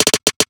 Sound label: Trap Drum Fill